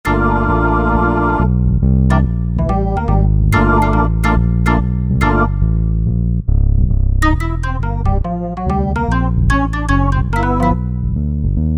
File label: Bass Bomb, Korg MSC-9S Organ